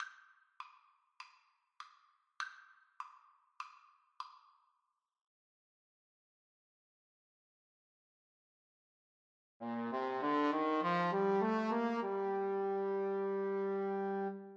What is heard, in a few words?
Trombone Duet